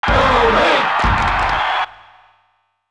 Crowd Go Link

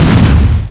Bomb Blow; Link's bomb exploding (also used by Toon Link

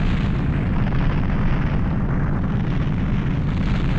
Temple Of Time Crumble Mono Loop; Zelda; OOT

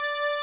Notes Music Box D loop; Zelda; OOT

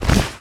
Seagull Flap

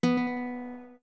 Notes Guitar B med

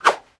Sword Slash; Sword-slash (standing or jumping)

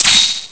Sword Clone Charged; A sword fully charged for cloning

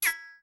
Z Target Off; Toggle Z-Targeting

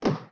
Arrow Hit; An arrow hitting (Recorded)

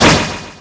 Boomerang Fly; Toon Link; Zelda

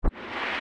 push crawl carpet; zelda; OOT

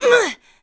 Struggle; Zelda

